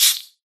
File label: hit, silverfish; mob; Minecraft